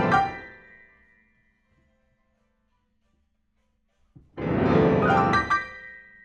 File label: PIANO, RNR for piano